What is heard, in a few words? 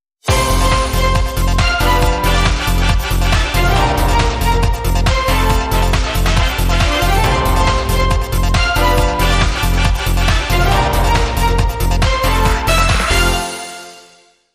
Rainbow Strings